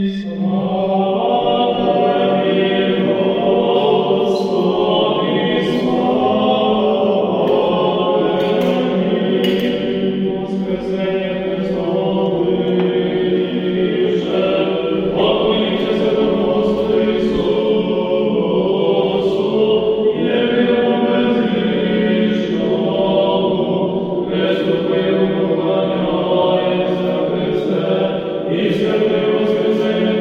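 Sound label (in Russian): zvuk hora cerkovnogo, church choir sound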